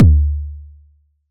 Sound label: Toms Medium